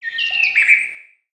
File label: Peaceful Bird One shot